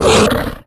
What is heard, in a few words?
pdog threaten, pseudodog; russian; sven; russian sounds